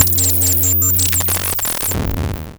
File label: Mosquito Decoder, Cwejman S1